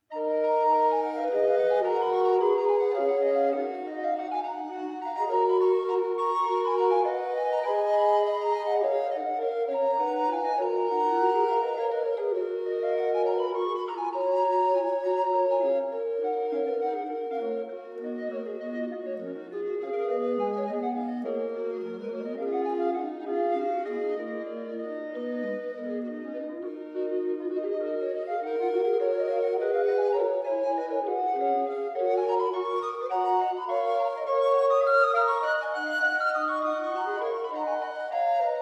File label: Instrumental; Tandernack Uf Dem Rin Lag